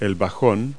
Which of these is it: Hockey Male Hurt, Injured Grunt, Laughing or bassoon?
bassoon